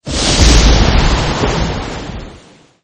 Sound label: rain